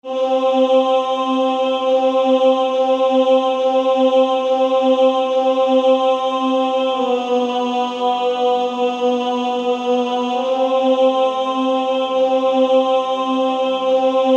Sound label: Female Choir; Low In The Grave He Lay